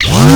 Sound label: Osprey Shield Funnel Start; Characters; Corpus